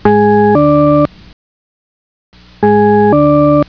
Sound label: tune